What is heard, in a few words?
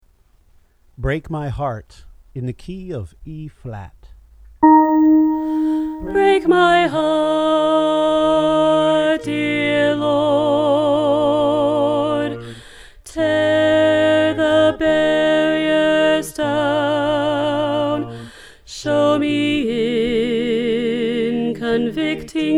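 Alto, choir part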